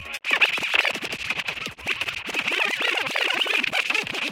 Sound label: Dj Scratch Sms